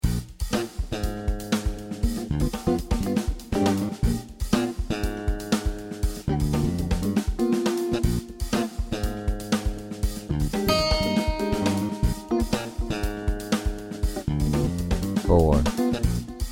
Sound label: Bb Mixolydian b2; Harmonic Major; Direct Application Ear Training